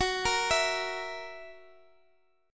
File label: F sharp 7th, Guitar, DADGAD; x,x,x,11,13,14